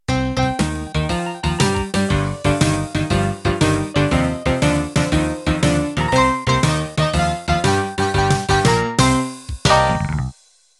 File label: Rockabilly End